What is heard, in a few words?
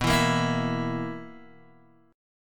B Major 7th Suspended 4th B down Guitar DADGAD